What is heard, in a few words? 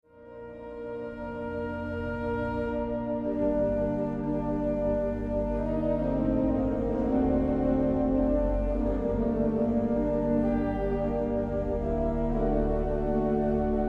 Sarabande